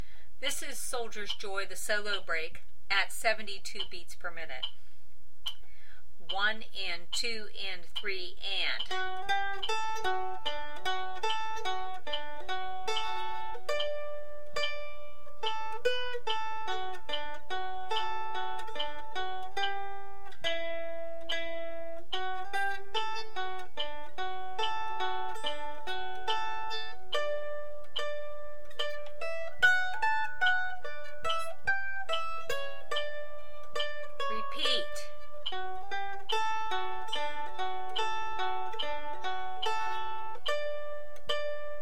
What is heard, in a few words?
Solo Break 72bpm, mandolin